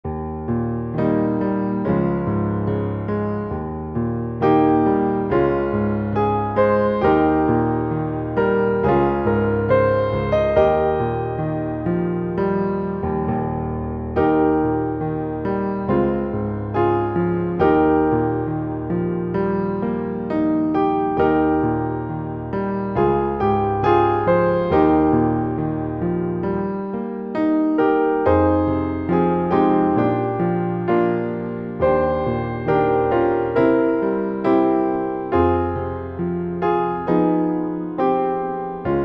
Piano Only; Press Forward

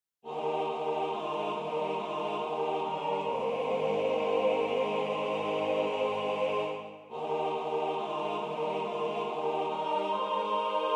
Hymn; An Instrument for Thee; SATB

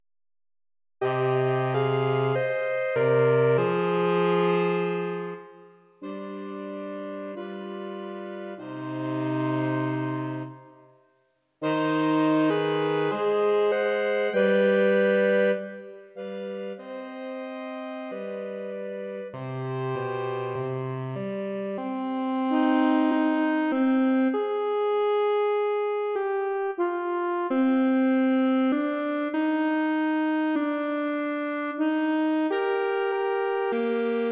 sax trio

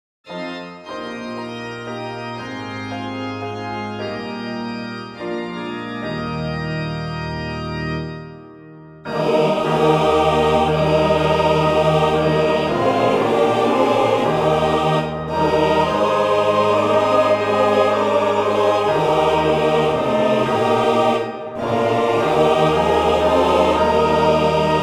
choir; With All the Power of Heart and Tongue

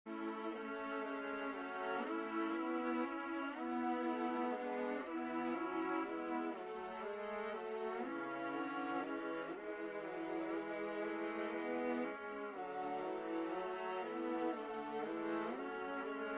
My Heavenly Father Loves Me, Viola, A Major

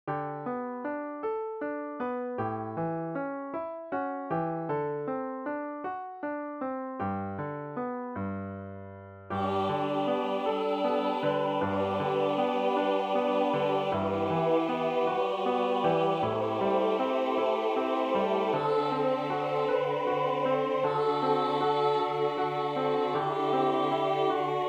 piano; Amad a otros

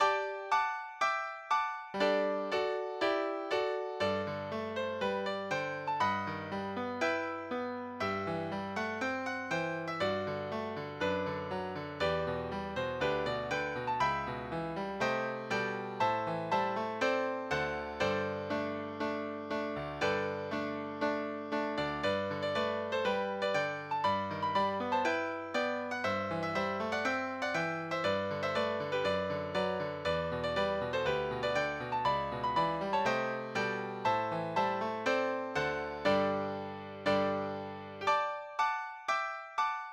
Battle Hymn of the Republic smooth style

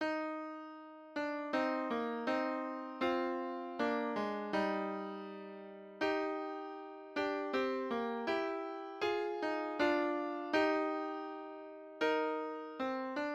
Piano Solo; Fairest Lord Jesus

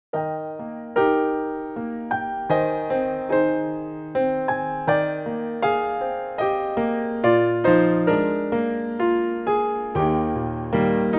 Piano; A Broken Heart

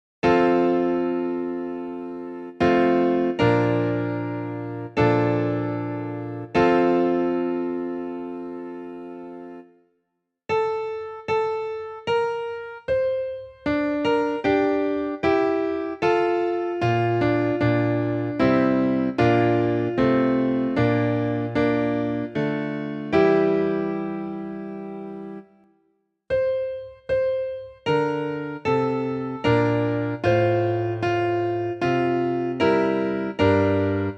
Peace I Leave with You piano

